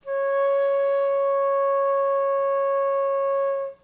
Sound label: D5 baroque, flute